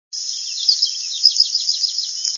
Lincoln's Sparrow - two part "House Wren-like" song with unknown possibly White-crowned Sparrow at end of third sequence; Toulumne Meadows along Mono Pass Trail; Hermit Thrush were also singing in area.; birdsongs